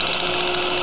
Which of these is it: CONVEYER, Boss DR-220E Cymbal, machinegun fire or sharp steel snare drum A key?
CONVEYER